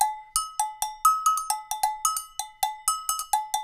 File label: Percussion, 132; Sampleheads; NYC Percussionworks; 90 s Sample CDs